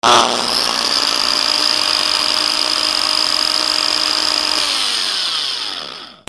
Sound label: GARAGE